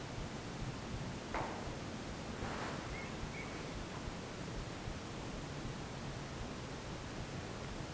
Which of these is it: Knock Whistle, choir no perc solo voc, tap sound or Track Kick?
Knock Whistle